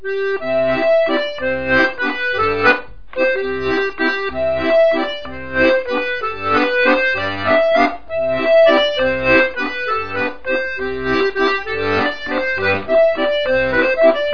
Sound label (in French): Valse Ecossaise, Ecosse